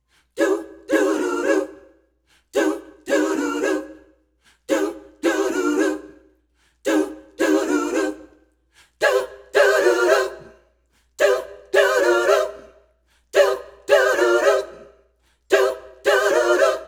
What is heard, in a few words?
voice doo doodoo doo; Phonky